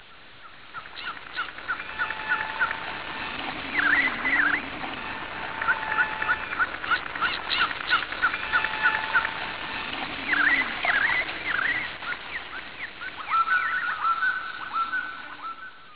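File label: Environment swamp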